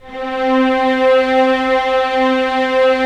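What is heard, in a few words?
STR SC, strings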